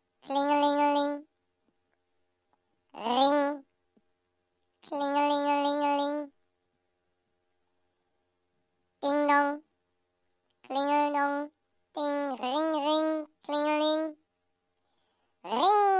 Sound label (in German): lustiger ring deutscher klingelton